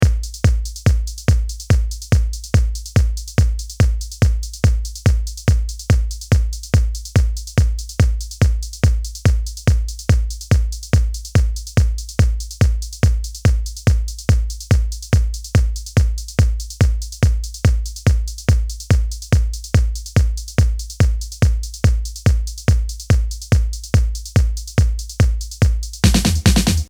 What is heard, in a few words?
intro Drum